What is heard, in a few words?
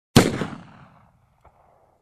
Gun fire with echo